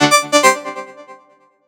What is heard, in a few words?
Scene alert; Sony Ericsson; PlusFourSix; SE Sound Project; Lars Edvall; Walkman Fam 4